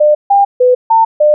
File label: cartoon honk; horn; hornsounds